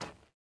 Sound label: footsteps dirt